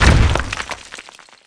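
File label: grenade; Rainbow Six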